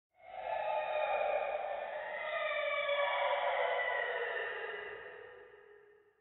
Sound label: child's soul